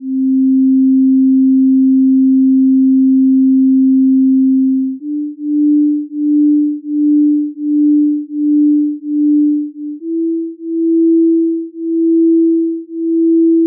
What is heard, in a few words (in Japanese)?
純正律とのビート音階, 基のみ; 付録D：音階・音律